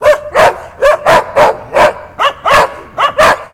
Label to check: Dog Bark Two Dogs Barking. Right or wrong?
Right